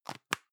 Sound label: plastic can lid screw close medium; objekte; dose